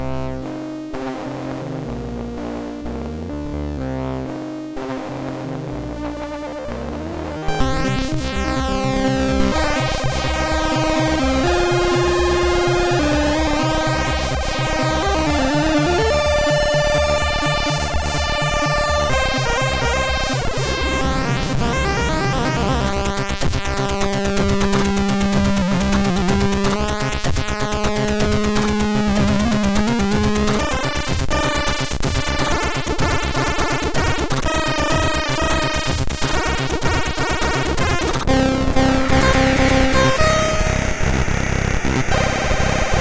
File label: Loader, MOS6581R3; hvsc